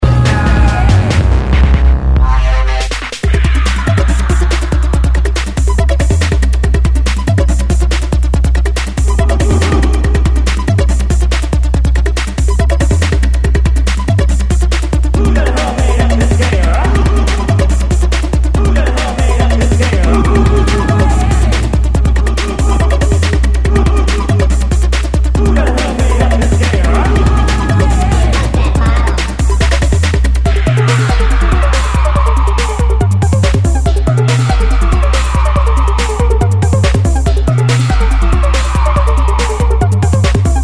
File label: Bass Myami Style